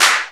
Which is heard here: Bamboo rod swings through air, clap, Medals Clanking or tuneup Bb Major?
clap